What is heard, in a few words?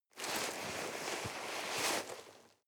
nylon leather soft rustling